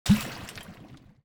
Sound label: projectile; necrochemist; Shelter